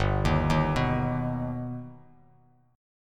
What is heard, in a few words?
G Augmented, Bass Standard; G+ arpeggiated; 3,6,1,4; JGuitar